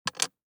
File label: DICE on Glass, Drop, Standard, Six Dice; Samples; ArticulatedSounds; DICE Sfx Party (Extended)